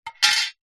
foley tin lid metal thin small set down surface

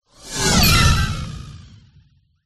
science fiction robot burst

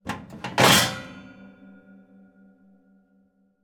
oven grill tray place in grill

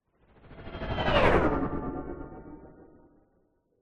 science fiction hovering vehicle pass by fast

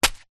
foley footstep single flip flop dirty concrete

